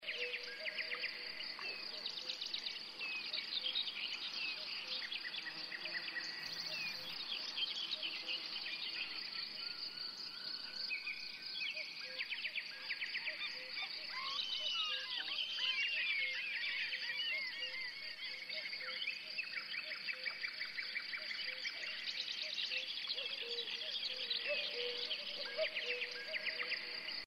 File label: jungle; tak bites